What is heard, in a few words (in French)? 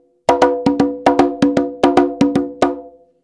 frise, congas